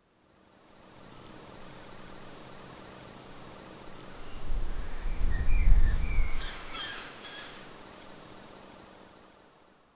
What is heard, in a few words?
gentle wave crashes seagu, beach